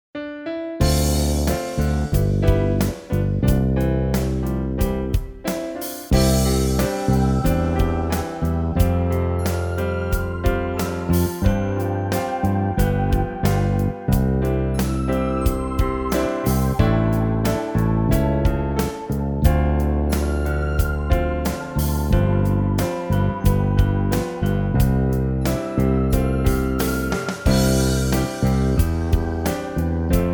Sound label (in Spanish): Pista instrumental sin melodia